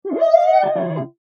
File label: Foley Glass Squeak Clean Window Single